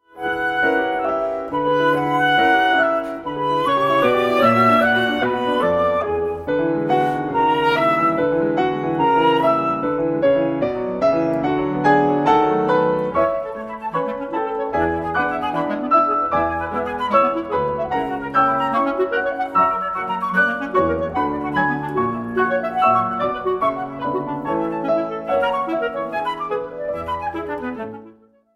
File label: tarentelle for flute clarinet and piano; camille saint sans